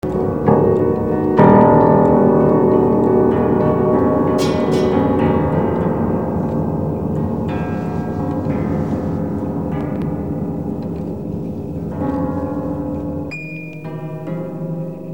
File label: prepared piano; Nam June Paik; Prepared Piano for Merce Cunningham 1977